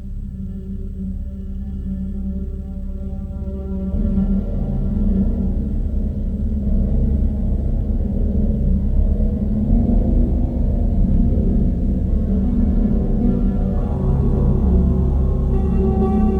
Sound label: detuned piano